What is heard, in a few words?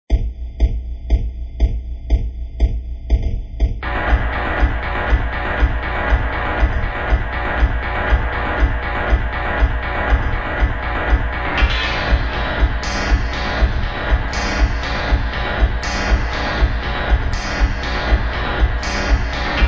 lofi